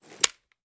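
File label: pistol reload fast end; gmod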